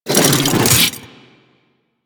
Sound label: Rifle Reload; DE; Warframe; Weapons; Sentient; Sent Rifle New War